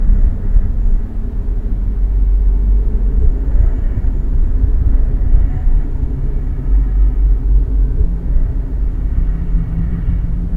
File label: club room tone; Left 4 Dead 2